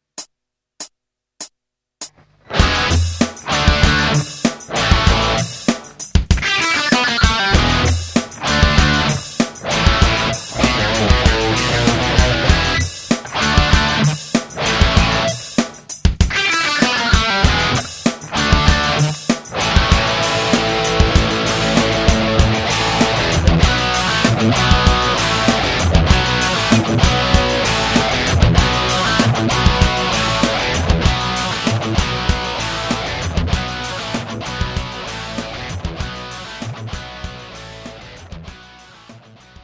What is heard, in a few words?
jam